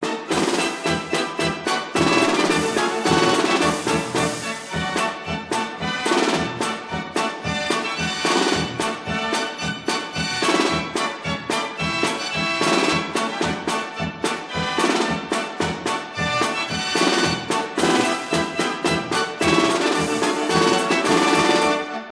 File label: Bursens Arburo Dance Organ (Jazz Orchestra)